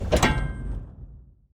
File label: Wheel stop sound